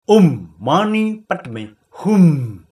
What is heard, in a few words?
Om Mani Padme Hum, mantra